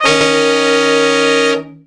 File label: BRA SECT; BRA SECTIONS